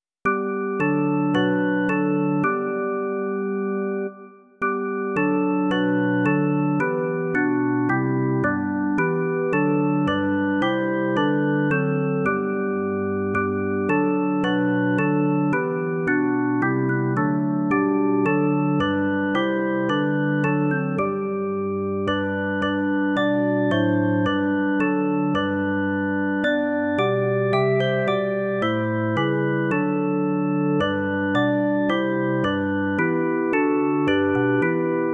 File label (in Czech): jazzový el. klavír; Amen, Otče, rač to dáti; Imaginativní verze